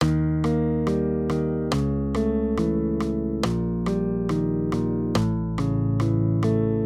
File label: maj triads; Eb-A; accompaniment